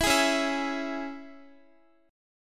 C sharp Minor, Guitar; strummed; C#m; x,x,14,13,13,11

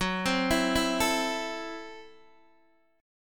Gb Minor 7th Flat 5th, Guitar Open D; x,9,10,10,7,7; down; chord shape

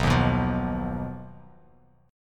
B Major Flat 5th B Bass Standard; BMb5; strummed; 7,6,9,10

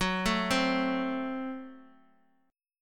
Gb Diminished, down, Bass Standard; arpeggiated; JGuitar

